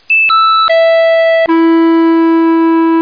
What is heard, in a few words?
clarinet; music samples